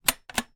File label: household small metal ventilation vent slide open closed